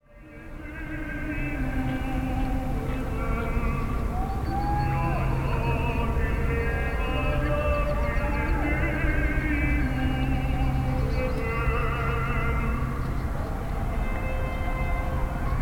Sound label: uppsala bandy opening